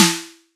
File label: metallic snare one shot